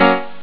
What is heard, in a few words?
power piano; Amiga Soundtracker Sample Packs